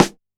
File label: Rim, drum